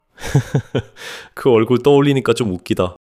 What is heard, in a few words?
laughter